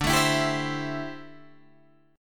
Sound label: D 7th Suspended 2nd Suspended 4th D, Guitar DADGAD; D7sus2sus4; strummed; 12,12,14,12,15,14